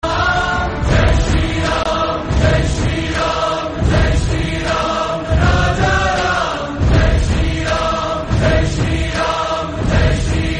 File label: music